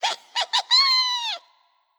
Gnome Laugh

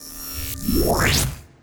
Unstable Charge; Unstable Chanell Charge1

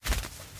Pigeons; gamerip

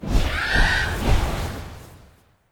Harpy Gust; Legend of Keepers (gamerip) (2019)

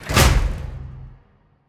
Sarco Slam; Legend of Keepers (gamerip) (2019)

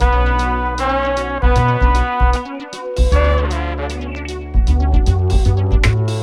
New Bpm77in L; Hajime

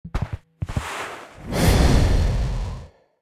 Skill Gas Breath

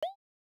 Spider Footstep; starbites

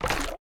Damage

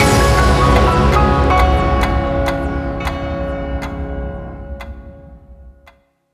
Music Decide; Syssd Music Decide Se Default Present

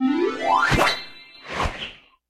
In Ready Item Paperplane

